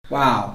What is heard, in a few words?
waowwwww